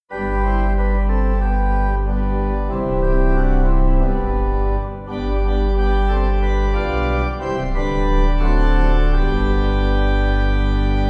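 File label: pipe organ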